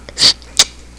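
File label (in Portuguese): BEIJO, SONS PEDIÁTRICOS; PEDIATRIA UFF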